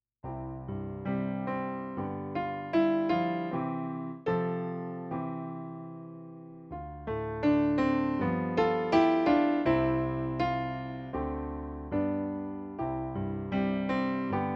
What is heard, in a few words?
Piano